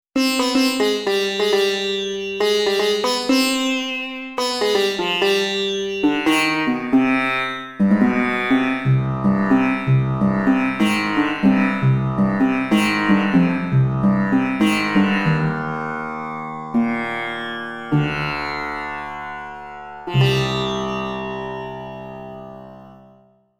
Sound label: Sitar Verb